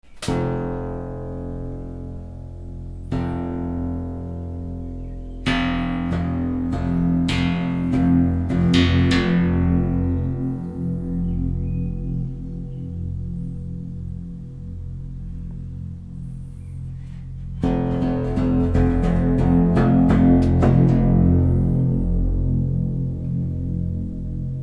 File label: Monochord